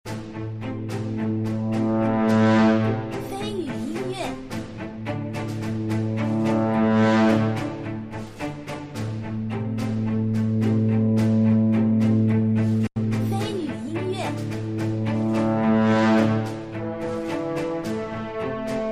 海洋-军事-大气

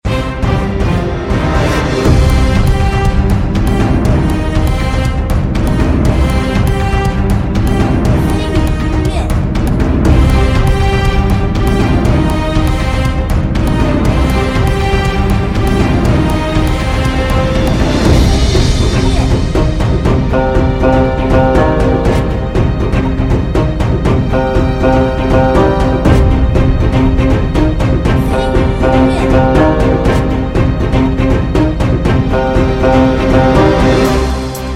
大气 压抑 磅礴

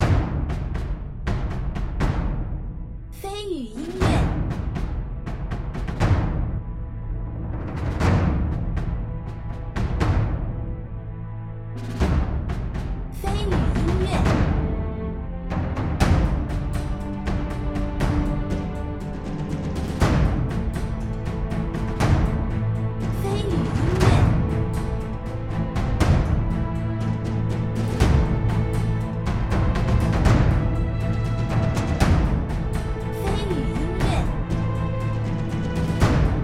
帮会地下宫殿

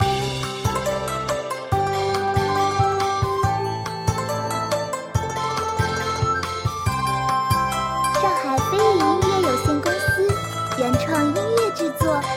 轻松欢快; XY斗地主

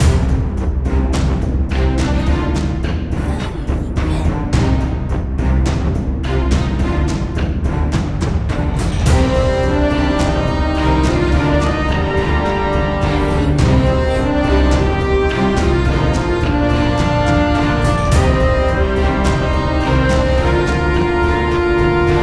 征战

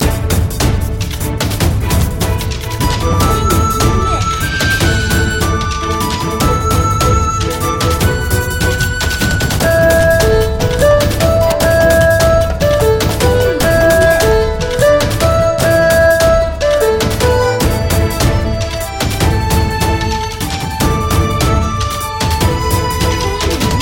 战斗室外

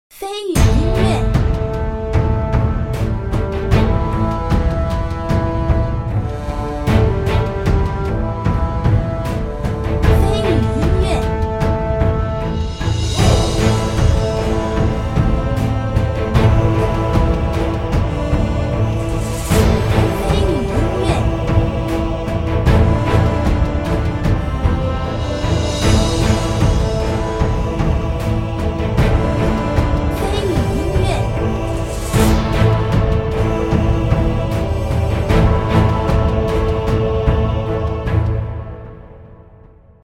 激昂-庄严-压迫

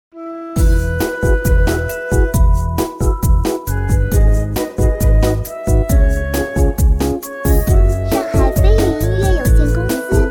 轻松 欢快 休闲 日系

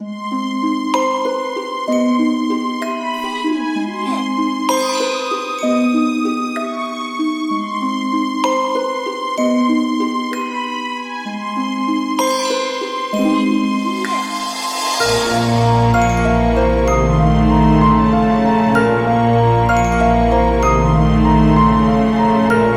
寂静矿场

